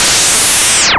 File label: lazer; SMB3Memes; EoSD Chiptune